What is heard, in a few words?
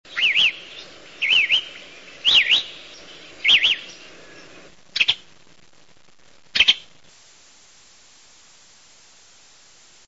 Flame colored Tanager, songs